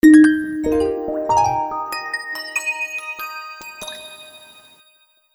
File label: Waterdrops; LG V31 isai VL